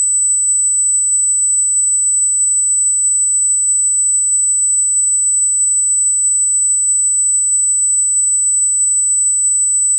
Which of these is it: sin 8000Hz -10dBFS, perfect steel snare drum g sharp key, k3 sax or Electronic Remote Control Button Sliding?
sin 8000Hz -10dBFS